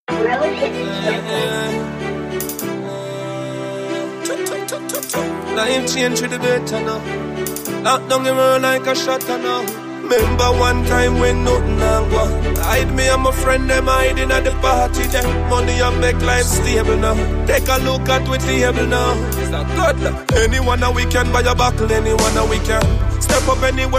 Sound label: dancehall riddim